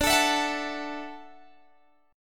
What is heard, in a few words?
D-Major Add 9th D down Guitar Open G